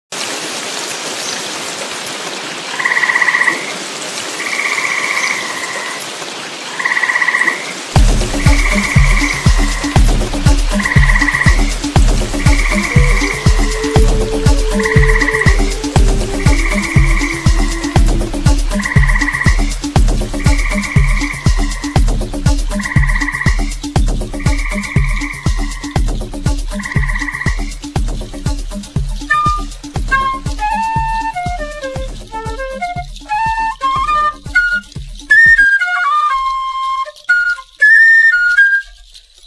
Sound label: jungle